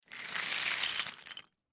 Crash Debris Rock Move Slide Push; Sound Effects:Materials; Blastwave

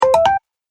Message Notify Sound, Dong

